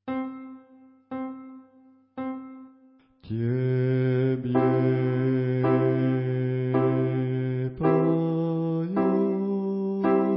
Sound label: basse; tebe poeme